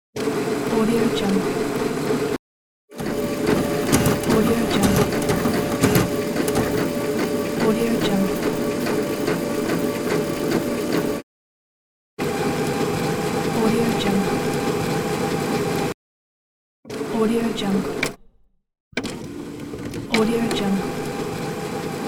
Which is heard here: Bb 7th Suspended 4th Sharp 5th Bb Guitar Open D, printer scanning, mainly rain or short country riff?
printer scanning